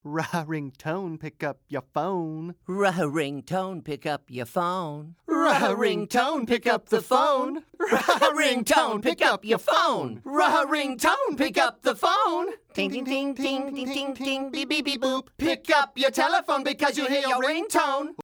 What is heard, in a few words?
Ring Tone Song